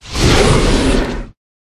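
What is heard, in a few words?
Dragon Skeleton buff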